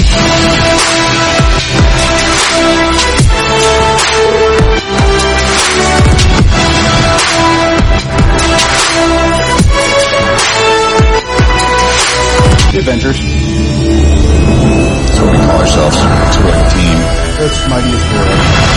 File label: game BGM; Avengers